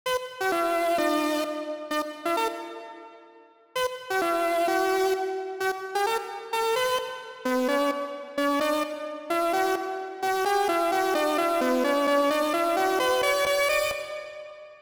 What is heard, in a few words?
synth